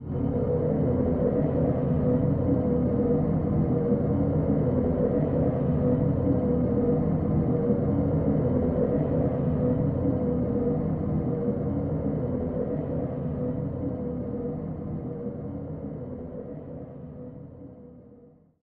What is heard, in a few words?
Basilisk Broom Idle Loop, Rocket